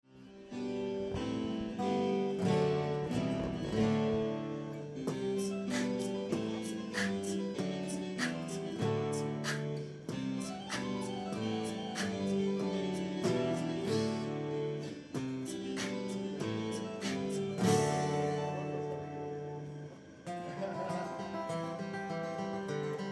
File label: Hip Hop Bach Rehersal; Pier 6